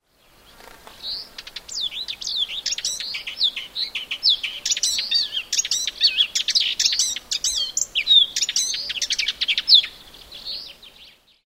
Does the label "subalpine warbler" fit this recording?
Yes